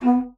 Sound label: B3, bassoon; Xsample VOL-6; C BASSOON